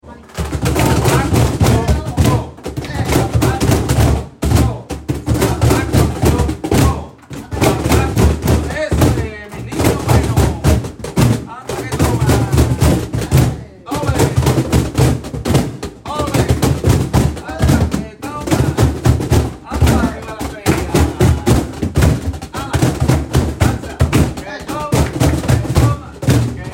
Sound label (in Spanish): percusion